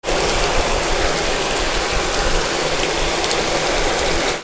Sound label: BATHROOM